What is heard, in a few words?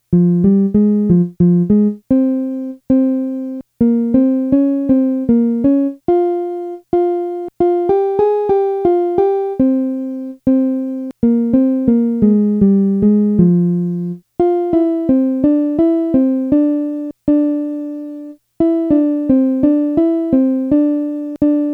melody; southern songs